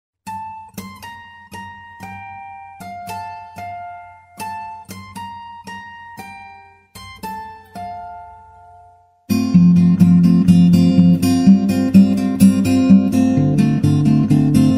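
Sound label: on guitar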